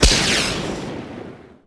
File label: LAUNCH; Shadowman; weapons; marteau